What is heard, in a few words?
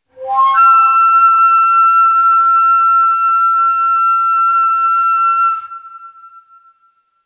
Tiz Neva, Ney